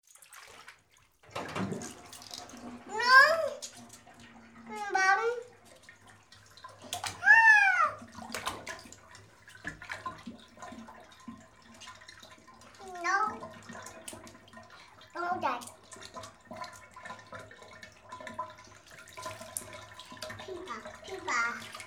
small child bathing

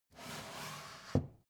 book shelve soft short

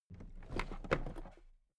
toolbox raise regular

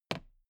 toolbox handle bounce regular

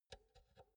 insert cinch quick

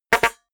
notification honk

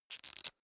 small book riffle through fast

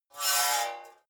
metal scrape rich fast

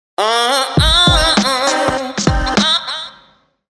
ah beat

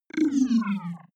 wobble drop juicy short

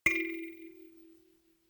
notification kalimba rattle second

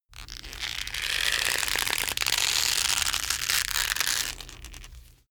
leather creak

